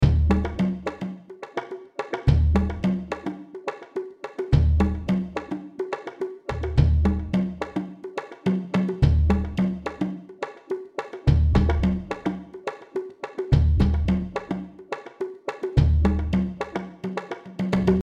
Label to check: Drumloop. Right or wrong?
Right